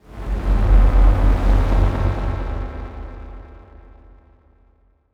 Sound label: Transition Noise Static; Production Element; Title Transition; AdobeCC